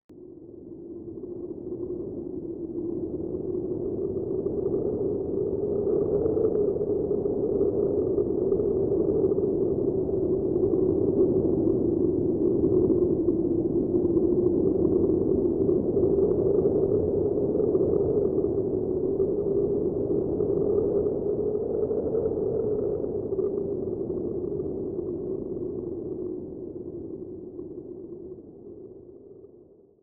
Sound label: wind snippet; matmos hl